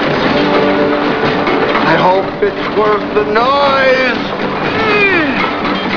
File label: noise; hood